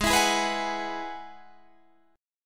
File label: G sharp Minor 7th Sharp 5th